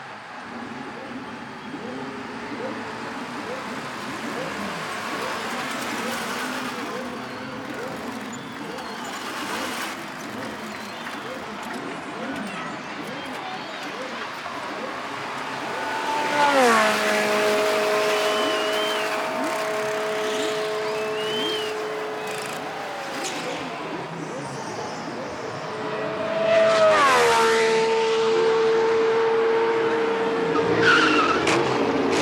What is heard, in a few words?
ambient; Crazy Cars; wazdan